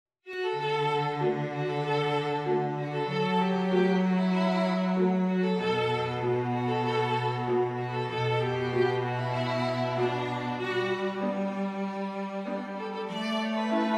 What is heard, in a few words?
STRING QUINTET